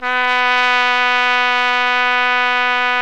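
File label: BRA TRUMPE, trumpet, brass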